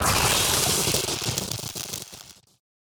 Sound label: Lightning Death Underlay